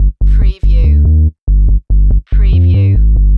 Techno-Trance Sub Bass Loop in G 142 BPM

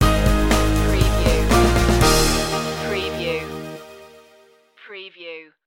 photograph stinger

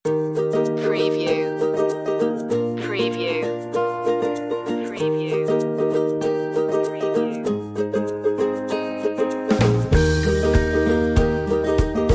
ukulele commercial gentle waves

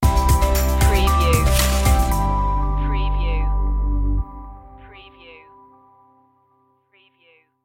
fresh start stinger

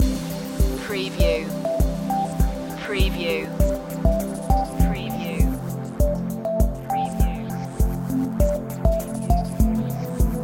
ocean breeze loop

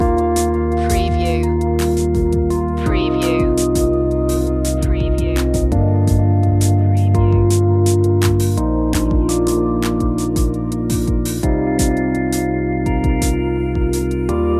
growing strong loop

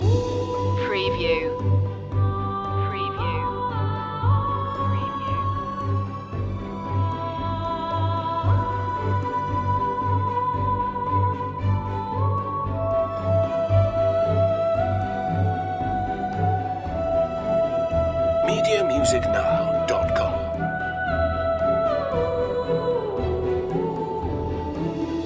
forming loop

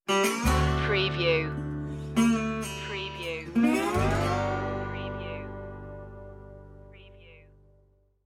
cajun stinger

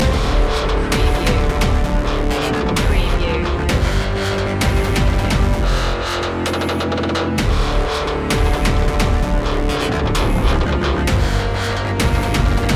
hybrid orchestra loop

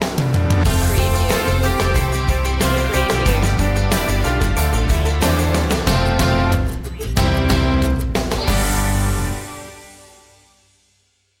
open door sting

